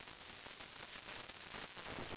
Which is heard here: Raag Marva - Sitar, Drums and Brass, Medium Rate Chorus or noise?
noise